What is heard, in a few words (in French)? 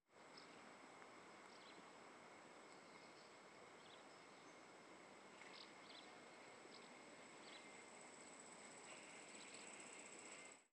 Friche Industrielle insectes Cymbale Et Pépillement; Rhinau